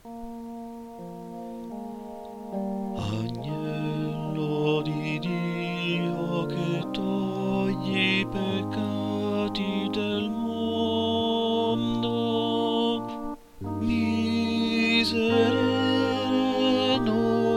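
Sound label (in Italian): Agnus Dei base tenor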